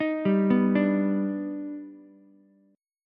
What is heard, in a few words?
D sharp Augmented Major 7th D sharp Ukulele; arpeggiated; 8,7,7,5; JGuitar